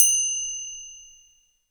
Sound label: FINGER CYM; Greytsounds VOL-1; SDX PERC